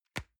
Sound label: envelope drop soft dry